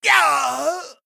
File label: Male Troll, Death